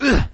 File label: hurt; hero1